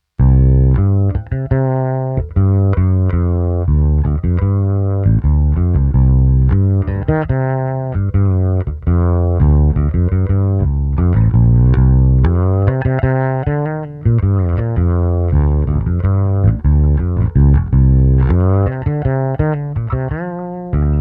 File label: EBS Classic Fretless